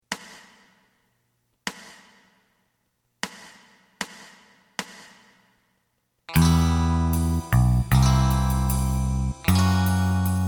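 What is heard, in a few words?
lead guitar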